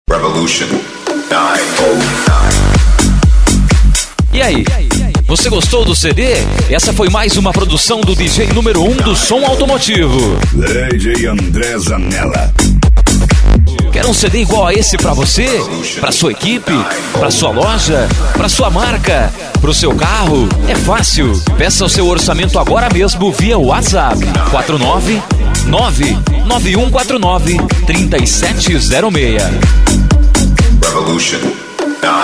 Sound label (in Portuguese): funk grave galera do som automotivo